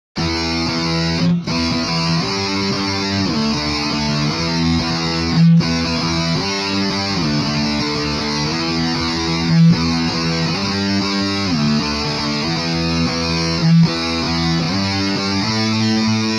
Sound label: electric guitar, shifting